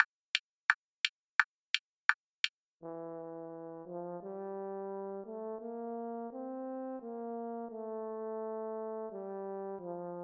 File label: horn slow